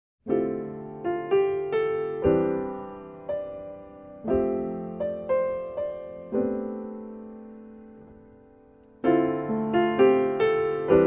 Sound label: Ballad Broken Heart; CooperSoundMp3Lib; PacificLounge